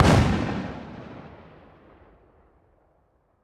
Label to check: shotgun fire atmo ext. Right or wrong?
Right